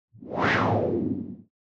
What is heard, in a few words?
Windy Gust; soundscrate